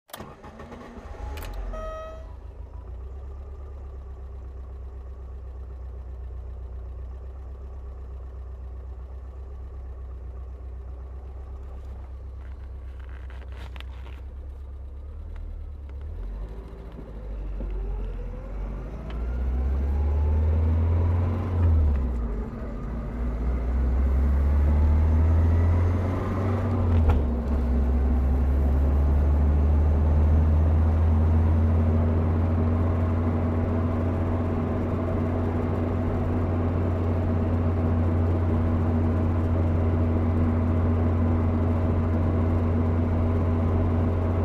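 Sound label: car driving; Звуки движения в автомобиле по трассе, шум во время езды с разной скоростью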